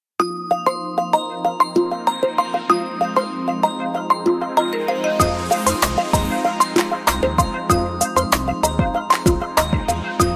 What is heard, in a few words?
Buoy; Alcatel OT-991D